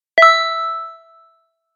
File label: text receive; Sound design for the opera composer: concepts and methods